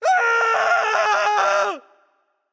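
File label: nonverbal fear; voicepack; smite; merlin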